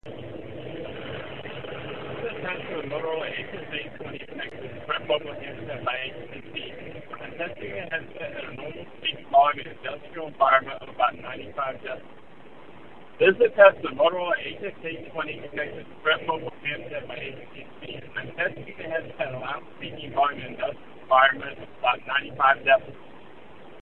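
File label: Indust 95db, headset; Moto HS820; Mogul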